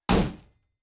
Shield Metal; Fantasy Sounds Bundle; Medieval Combat Sounds

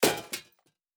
Armour UI; Fantasy Interface; Fantasy Sounds Bundle

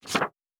Book Page; Fantasy Sounds Bundle

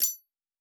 Jewel UI; Fantasy Interface; Fantasy Sounds Bundle

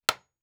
Nail; Fantasy Sounds Bundle; Craft

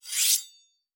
Weapon Draw Metal; Fantasy Sounds Bundle; Medieval Combat Sounds

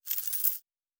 Coins; Fantasy Sounds Bundle

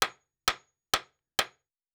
Nail; Fantasy Sounds Bundle; Craft

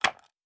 Wood Impact; Fantasy Sounds Bundle; Craft

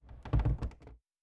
Wood Creak; Fantasy Sounds Bundle; Craft

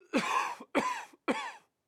Human, Cough, Male; DeltaVR; FMOD; coughing added as events

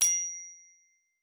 Jewel UI; Fantasy Sounds Bundle

